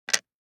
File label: DICE on Plastic, Drop, Standard, 4 Four Dice; Samples; ArticulatedSounds; DICE Sfx Party (Extended)